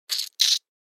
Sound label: Rat squeak